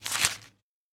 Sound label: turn, flipbook